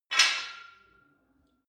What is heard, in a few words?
barbell weight rattle hard; sport freizeit